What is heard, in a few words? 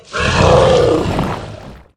Moss Elemental Play